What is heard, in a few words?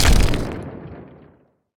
Ruins Stairs End